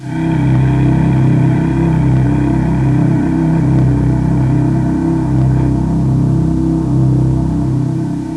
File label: collide